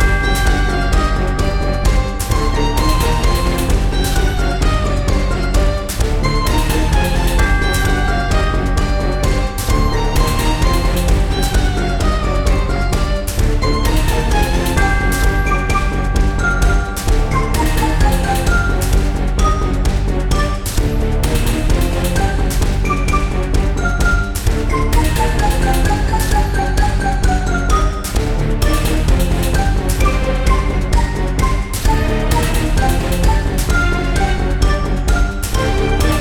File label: BGM ingame swamp; bail or jail; obakeidoro; windows